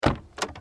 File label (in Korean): 폴더 열림 - 스포츠카 door; LG